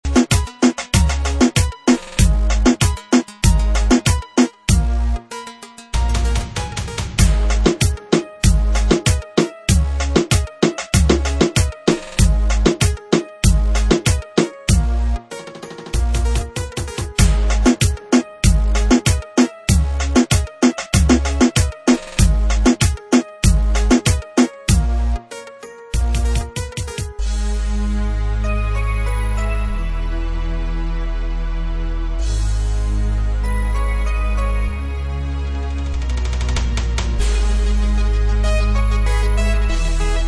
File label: Reggaeton Beat